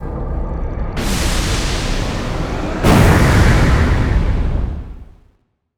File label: soul out loop